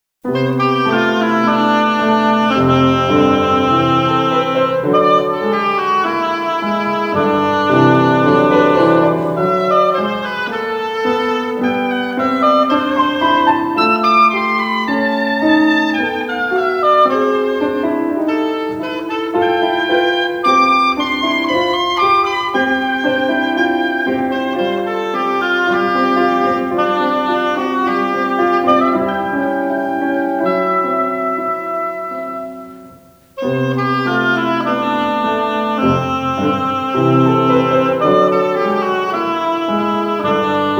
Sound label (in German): für Oboe und Klavier